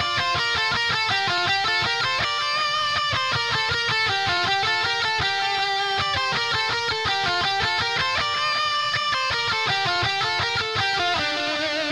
Drums and Jingles Battle LEAD

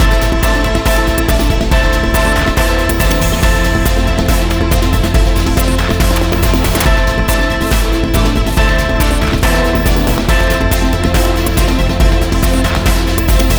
Trainride Stationary Fast; rive wreck hack die retry; ps4

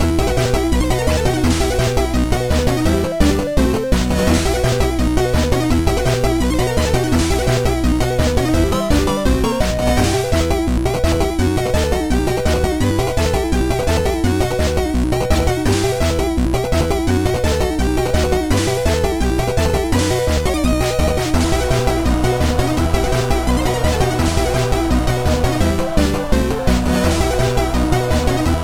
music boss loop

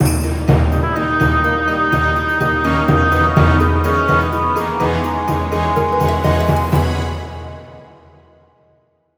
Dungeon Entry

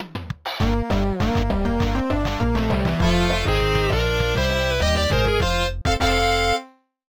Forest Outro; cars supercharged leapster gamerip 2007

